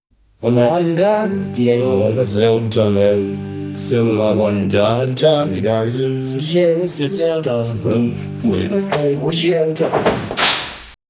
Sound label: birthday song cake, party